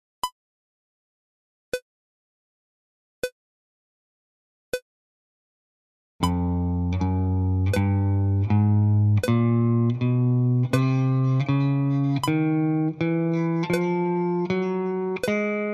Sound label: picking chromatic